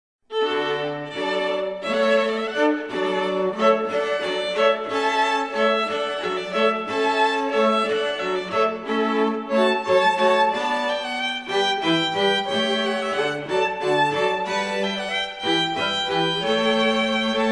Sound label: hornpipe, string quartet